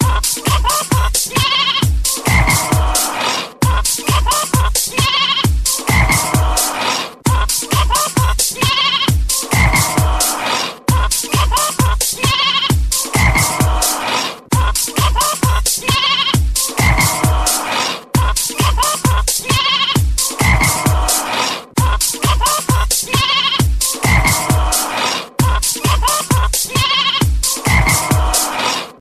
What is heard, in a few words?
farm remix ringtone